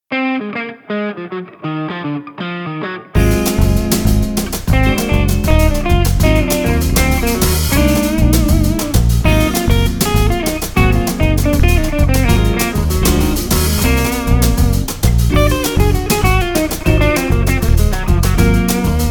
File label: lydian dominant